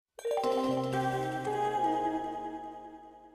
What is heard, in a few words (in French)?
annonce sncf jingle sncf grande gare